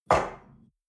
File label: espresso grab from table hard, restaurant cafe